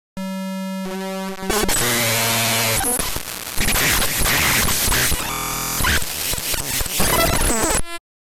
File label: glitch